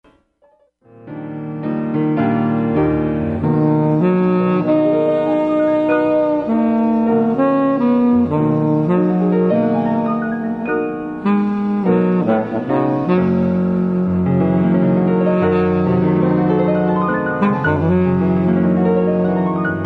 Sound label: piano sax